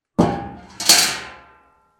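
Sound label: bucket sound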